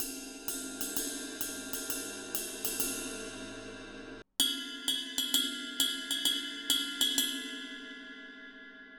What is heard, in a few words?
sabian 22 inch hhx legacy heavy ride ride bell